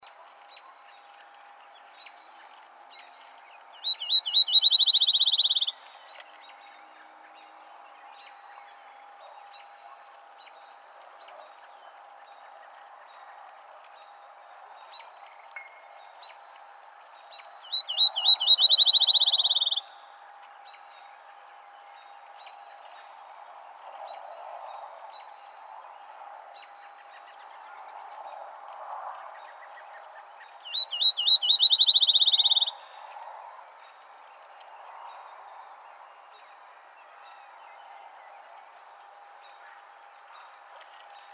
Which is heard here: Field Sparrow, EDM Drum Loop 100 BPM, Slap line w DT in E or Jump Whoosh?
Field Sparrow